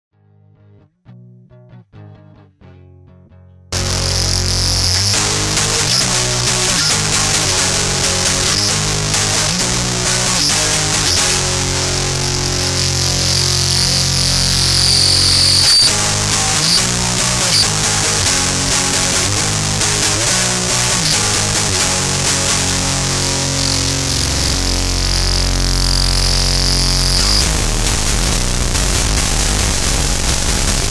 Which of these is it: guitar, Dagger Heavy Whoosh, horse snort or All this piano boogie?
guitar